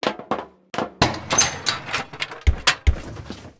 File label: Tank Get In